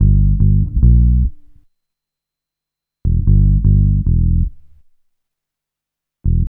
GREEN 74 G, Bass Grooves; USB Soundscan vol.30; AKAI

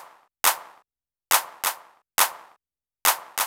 main clap, Techno 138 BPM; RED ALERT

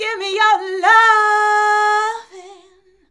"GIMME YOUR", FEMALES

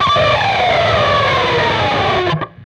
PRS SCRAP, Guitars; PRS SCRAPES

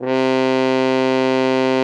bucket C3; Xsample VOL-3

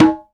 Percussion, House; Best Service; House Definition VOL-1; Drums

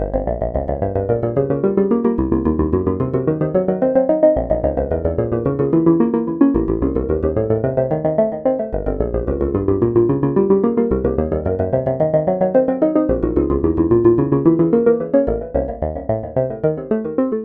Synth Sequence; Eclectic Electronica

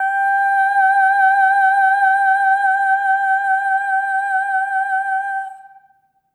EH OH G5, SOP; Spectrasonics; Symphony of Voices

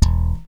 FACTOR SLAP E, BASS; Greytsounds

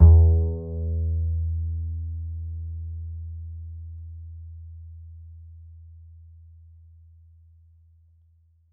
D string upright bass; Upright Acoustic Bass

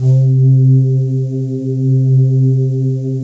digi pad, Pads

